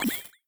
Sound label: pickup gold; Crypt of the NecroDancer